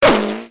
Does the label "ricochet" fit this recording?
Yes